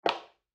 footfall heel; soundscrate

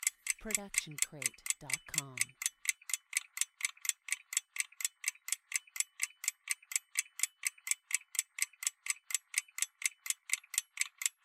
ticking clock; soundscrate

